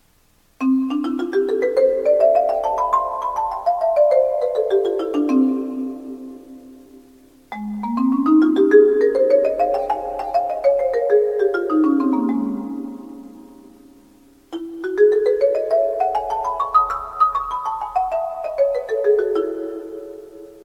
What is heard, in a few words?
scale set